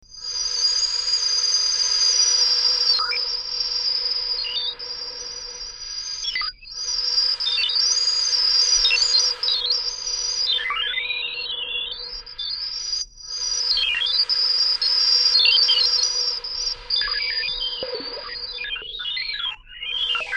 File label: shofar